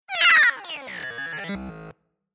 Computer Interface Telemetry Beep Robot Phrase Downwards Fast Interface Beeps Delayed; Tom Hutchings